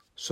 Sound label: Blow; Sonarse